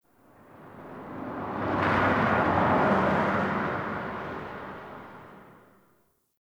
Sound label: Distant Traffic O; Warframe; Ambience; NineteenNinetyNine